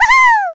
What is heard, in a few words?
Overjoyed Mermaid